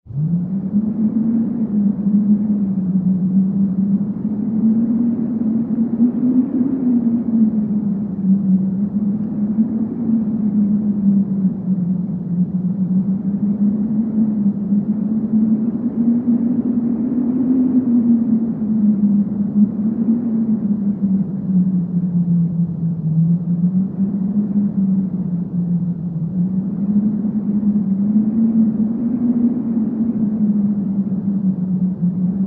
Soft Breeze

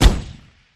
Gun Shot Single Silenced

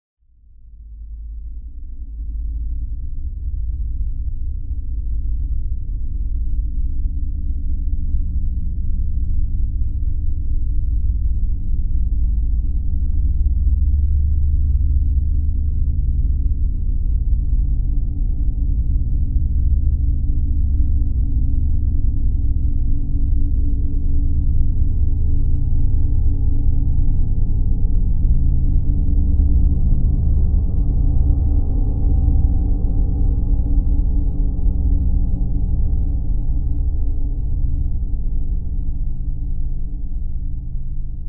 Bed Approach Danger L

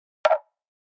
Button Hollow Wood Hi, buttons

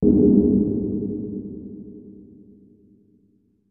Bass Impact Tonal So; impacts

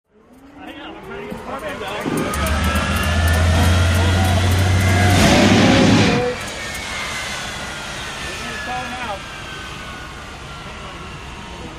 Hydroplane Dock Amb

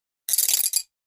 Mill Clack Wooden, percussion shaker

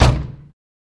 Door Close

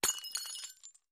Glass Breaks Distant; windows & mirrors

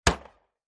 Harley backfire

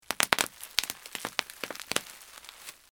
Bubble Wrap Popping

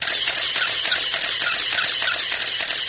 35mm Camera Auto Win

